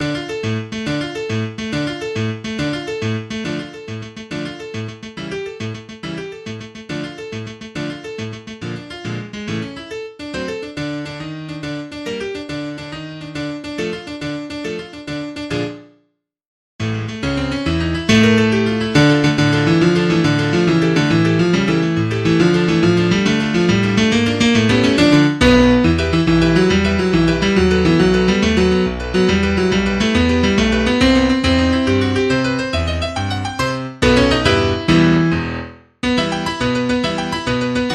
Tarantella

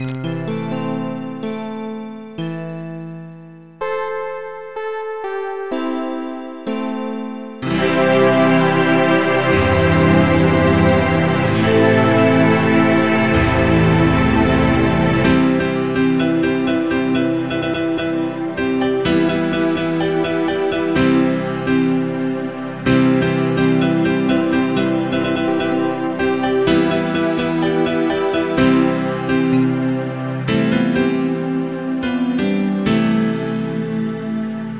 Anthem, MIDI; Original Composition

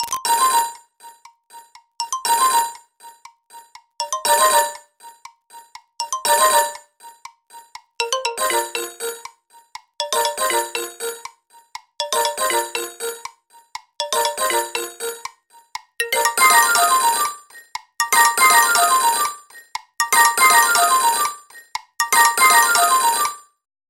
Clock alert MIDI; Clock alert 2; Nokia 1200 ringtone

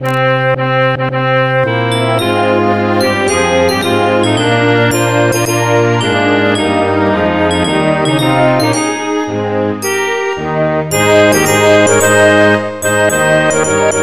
Finland presidential anthem

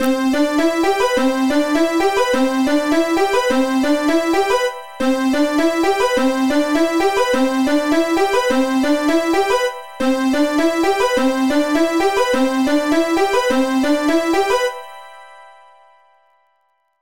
Benin EAS Alarm MIDI

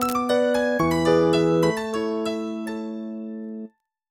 Message, MIDI; Nokia ringtone

